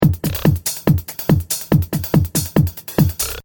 Techno-Trance Drum Loop 142 Bpm

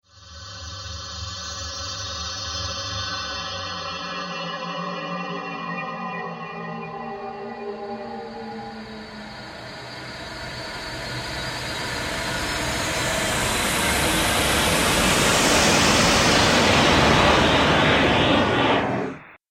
Ghostly Consumed Spirit; Ghost Sound Effects; horror sound effects

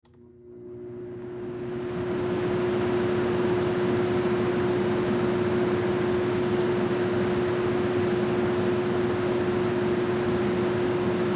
Stove Fan On Off, Stove Fan On Run Off High Oven; Household; Appliance

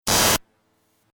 Static Glitch; sound design

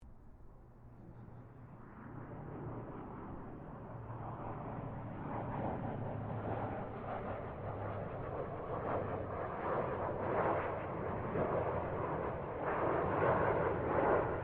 AIRCRAFT GLOSTER METEOR F MK8 PASS DISTANT; Gloster Meteor figter jet; Airplanes

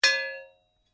Blade Heavy Moving Impact, Sword; Foley sounds for melee weapons; Swords (Sound Effects:Combat)

